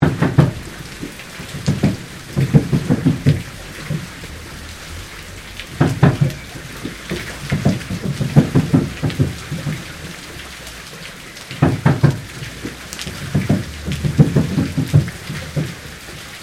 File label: Waterwheel Operating Loop, Replica waterwheel; Machinery; Machines